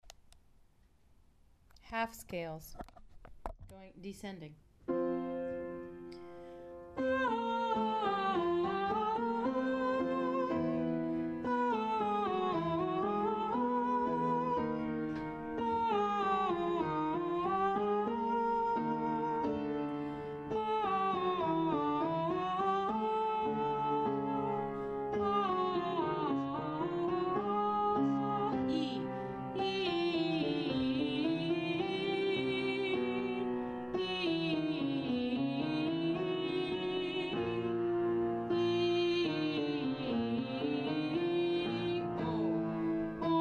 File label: Scales Descending, voice practice